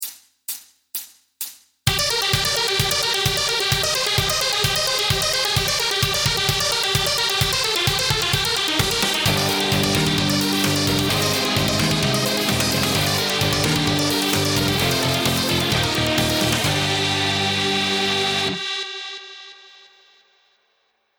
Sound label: Insane Shred God Exercise! (Backing Track)